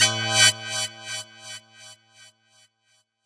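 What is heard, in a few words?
deep house FX, 125 bpm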